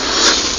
wormhole end

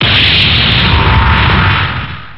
melee flash, weapons

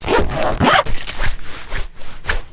medkit

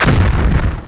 explode

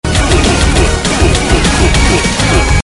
oof music

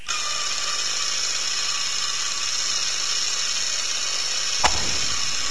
camera whirr

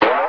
attack, headcrab

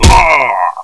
punch

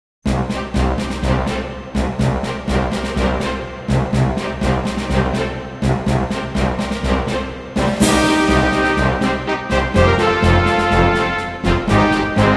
end song

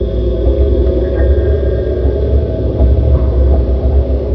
scary amb

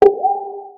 mana effect; ragemap2024; grunt

